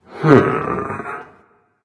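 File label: non verbal thinking, voice; cernunnos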